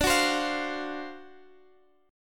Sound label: D 7th Sharp 9th; x,17,16,17,18,x; strummed; JGuitar